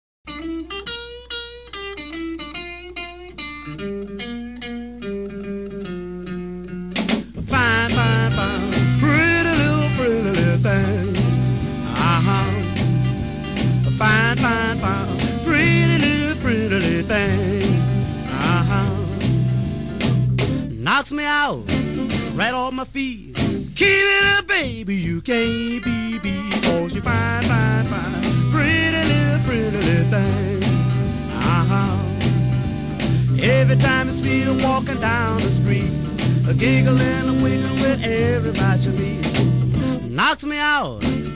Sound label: guitar